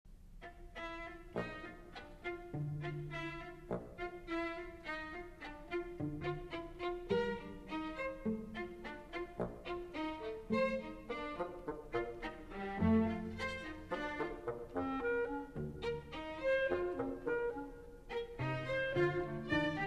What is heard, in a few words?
Concerto In E Flat For Chamber Orchestra, Allegretto